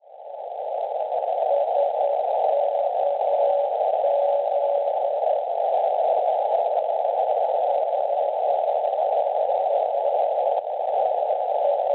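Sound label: NDB non-directional beacon, 357 kHz; SKZ; Leipzig; Schwarzsee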